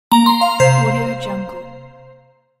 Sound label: out of time; alexander-blu